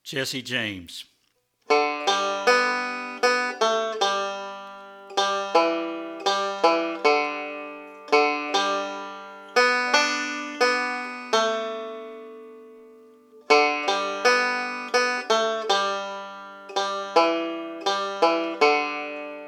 Jesse James Melody

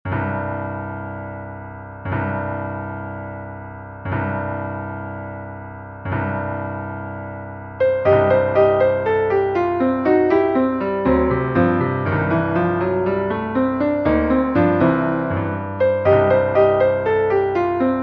Jazz Exercise

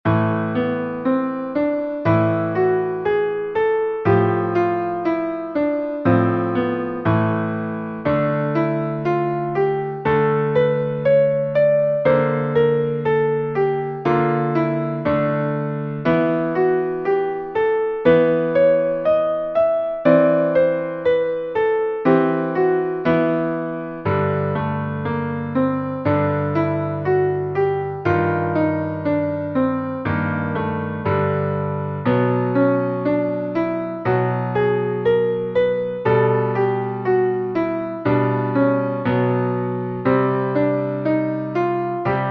Minor Scales Melodic Minor; Minor Scales 5a Melodic Minor

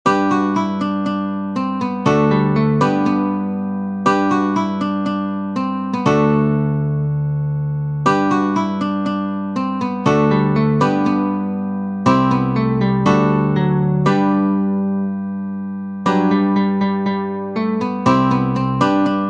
Melodic Improvs Guitar; Melodic Improvs: Guitar 1-4

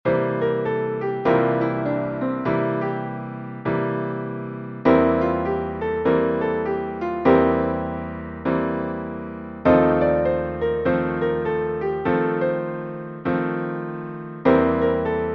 Scales - Blues Progessions; Scales - Level 2: Blues Progessions 1.2

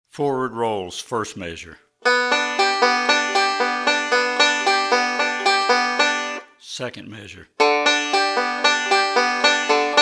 Forward Roll

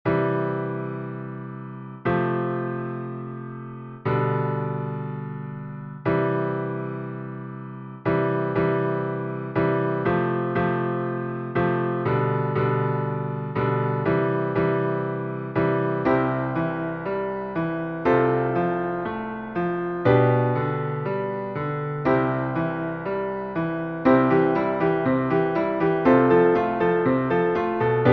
Chord Structures And Rhythms - C; Chords Packet 3

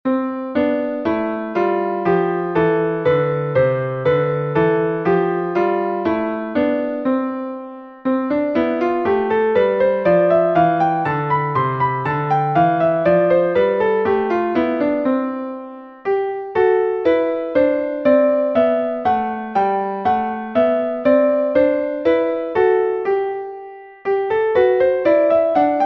Major Scales Double Octave; Scales 3 Major Scales Double - Octave 1

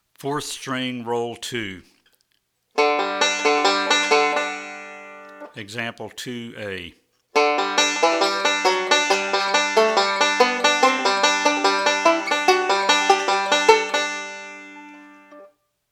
Rolls For Melody On 4th String

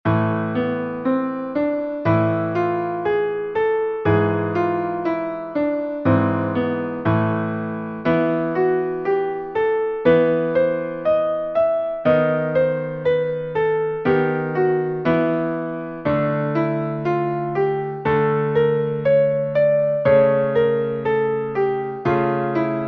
Minor Scales Harmonic Minor; Minor Scales 3b Harmonic Minor

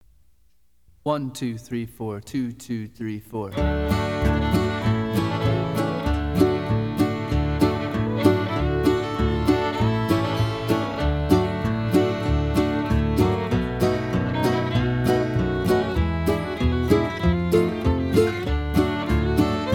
Improvising

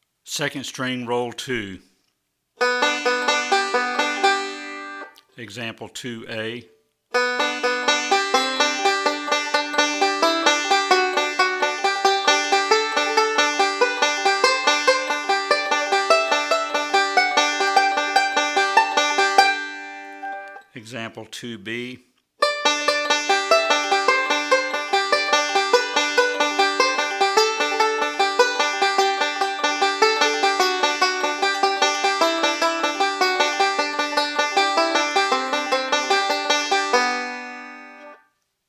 Rolls For Melody On 2nd String

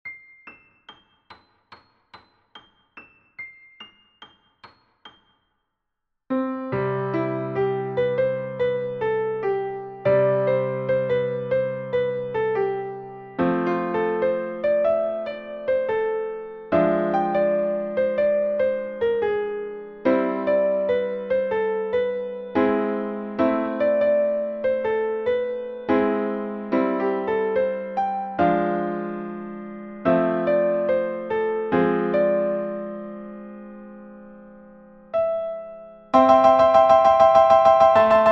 Easy Piano

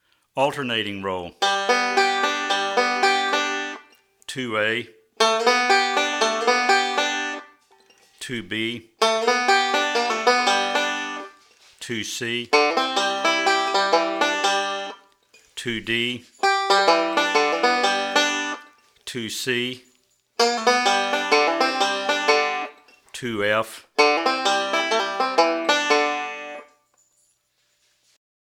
Alternating Roll

